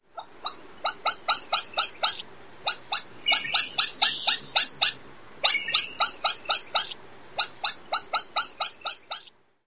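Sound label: alarm call female, Ruffed Grouse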